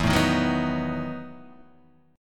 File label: E Major 7th Suspended 2nd Suspended 4th, Guitar, Half Step Down